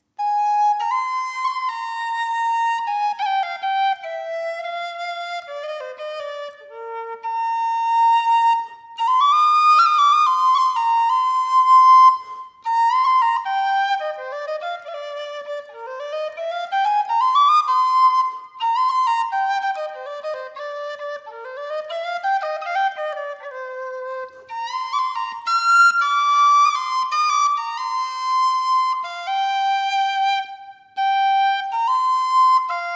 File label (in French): Flute bec en sureau Sib